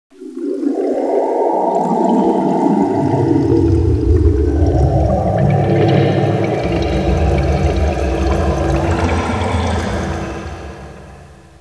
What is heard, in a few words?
Phone